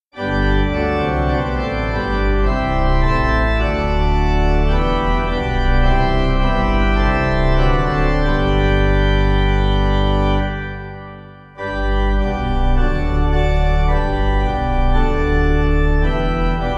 Nuremberg Pipe; Praise To God Immortal

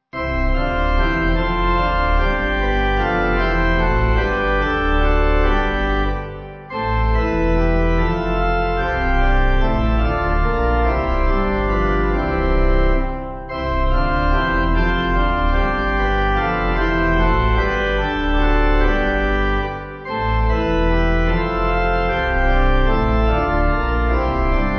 Rhythmic Pipe; Organ; A mighty fortress is our God

